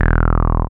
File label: BASS, Tekno Industrial; East West